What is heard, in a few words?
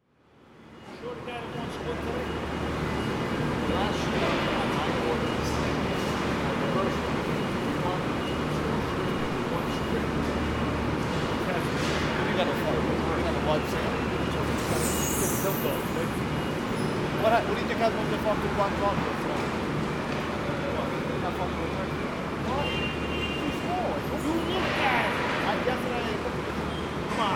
fish; Fulton Fish Market, NY, USA in January 2025; Harrison Malkin; It's quiet time at the Fulton Fish Market — though the forklifts still move fast